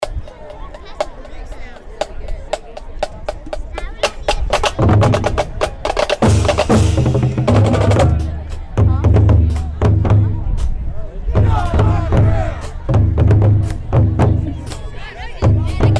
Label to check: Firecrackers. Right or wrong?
Wrong